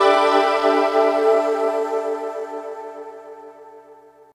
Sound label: Bowling Spare